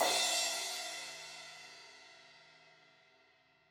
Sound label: Crash 16in edge, Cymbals; Deeply Sampled Drums; Cymbals Stereo